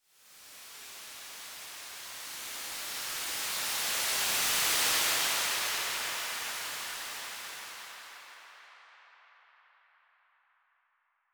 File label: Rising noise